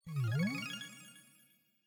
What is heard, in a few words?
heal